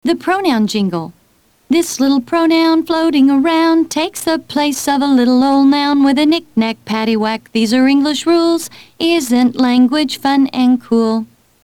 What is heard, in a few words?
pronoun jingle